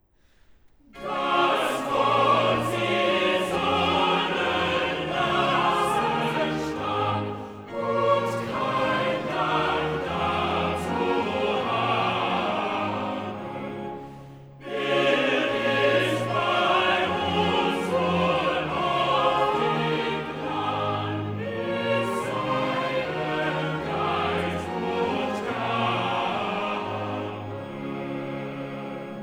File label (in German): Cantata, Choral; BWV 80 'Ein feste Burg ist unser Gott'; VIII. Choral 'Das wort sie sollen lassen stahn'; Bach; Wittenberg; Feast of the Reformation